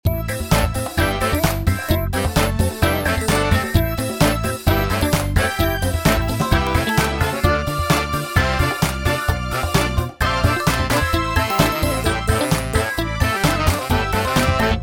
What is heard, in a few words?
disco, music; pbskids; go studio